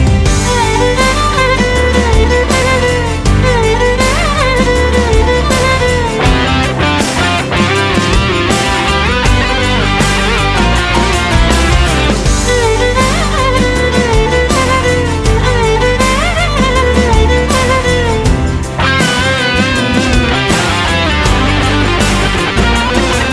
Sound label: Guitar Musiq